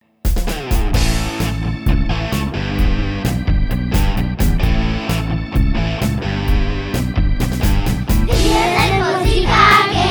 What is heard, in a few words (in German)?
Song Musik AG